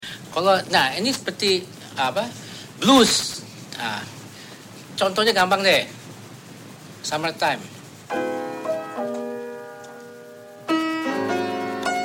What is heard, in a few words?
blues scale